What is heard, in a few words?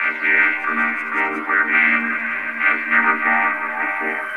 Vocode; Jungle; FX; Blu Mar Ten - Jungle Jungle - 1989 to 1999 Samplepack